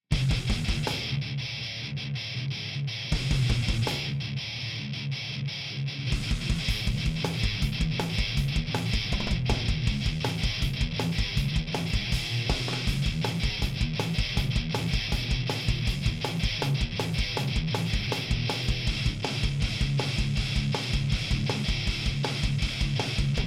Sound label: Drums Filter